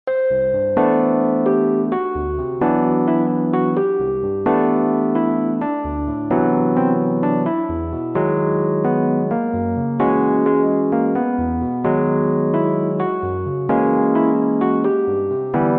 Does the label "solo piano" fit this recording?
Yes